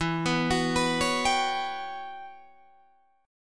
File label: E Minor 6th Add 9th, Guitar, Drop D; chord shape